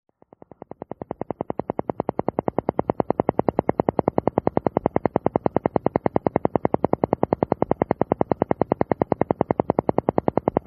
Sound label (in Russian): alpha zvuk